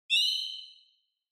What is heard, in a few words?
Flash Low Short; Onoma; オノマトペ 光る; 低 短